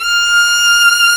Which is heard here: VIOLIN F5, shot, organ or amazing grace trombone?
VIOLIN F5